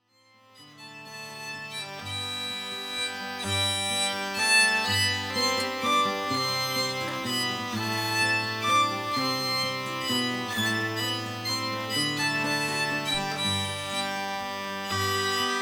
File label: Menuet